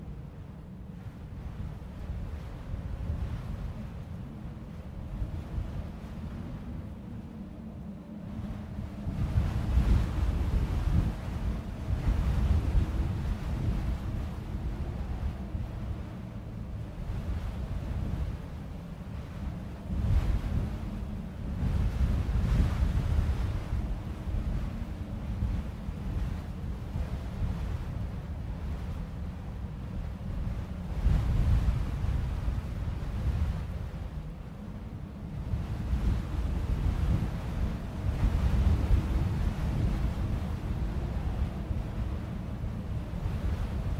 Music wind

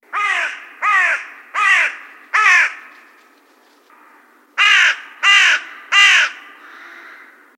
crow cawing great britain

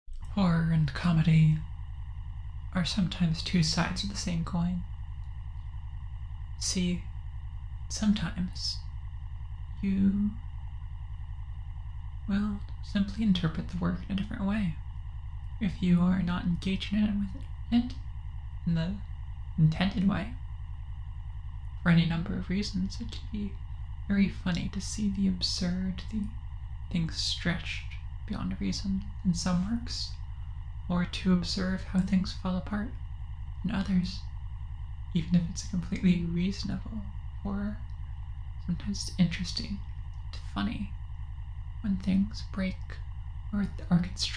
horror comedy

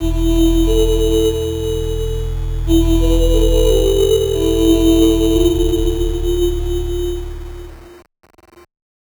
sound flare

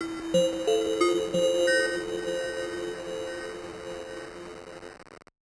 dream bell

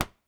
Plane Grass Stereo

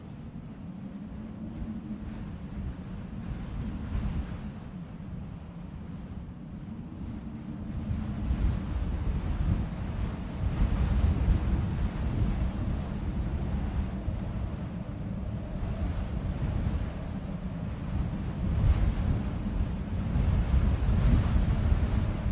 Music wind

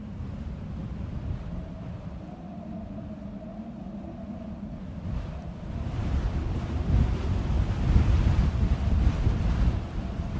Music wind

